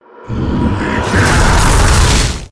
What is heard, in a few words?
scholar ghost atk; monster